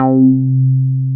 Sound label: SH101; OLD ROLNDS